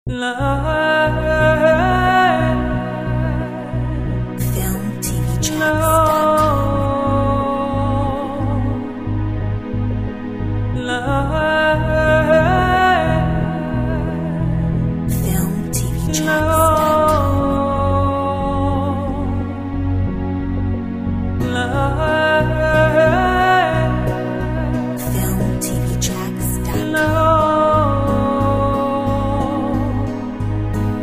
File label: A Joyful Chant